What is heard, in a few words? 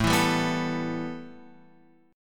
A 7th Suspended 2nd, A, down, Guitar, Open F; A7sus2 strummed